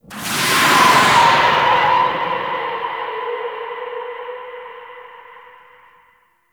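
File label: Artillery Cannon Projectile Launch; Enemies; Weapons; Warframe; Arachnoid Camper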